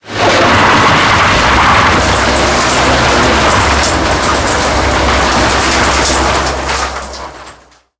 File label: turn wheel, tanks; engines; War Thunder